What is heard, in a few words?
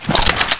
take gun; Soldat